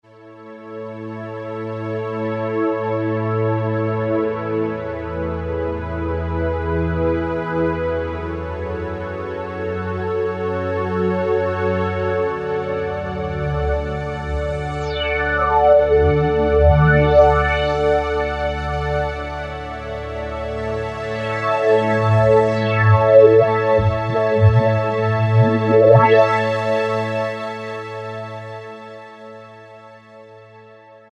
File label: Ambient Pad, Roland SR-JV80-06 Dance